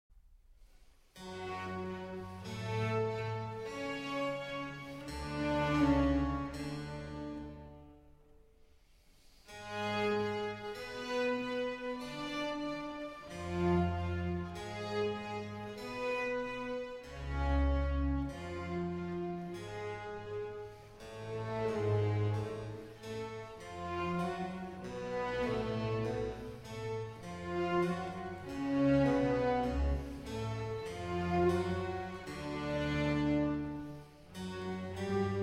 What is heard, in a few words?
Violin Concerto in D Minor, Adagio; BWV 1052R